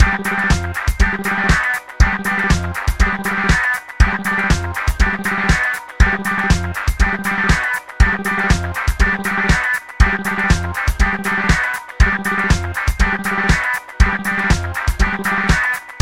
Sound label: Disco Music; Musicfish